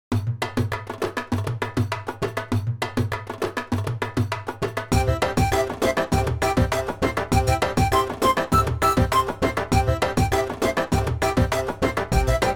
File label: bg run